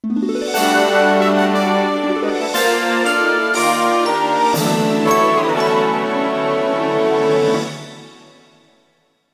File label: Jingle High Score